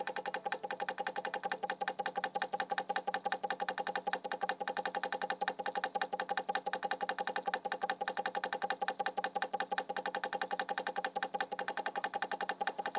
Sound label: auto stirring bubble liquid